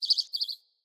Bird Song; Galene Bird Guide

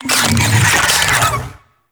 Infested Big Ashen Vocal Attack Death; Warframe

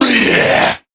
Death, Male

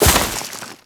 Step Veg, Footsteps; Enemies; Crewman; Warframe; Corpus

